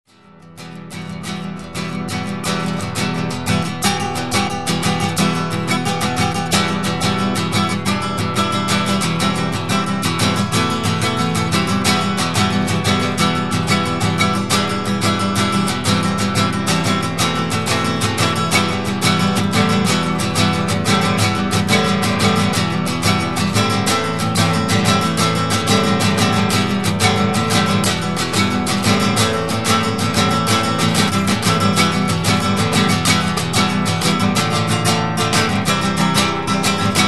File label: jam